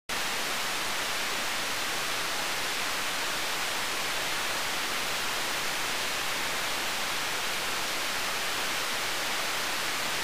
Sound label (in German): Gleichstrom 12V